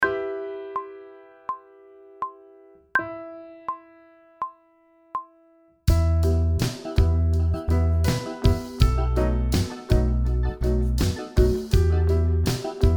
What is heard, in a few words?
Sight Singing backing track, pop vocals; Grade 3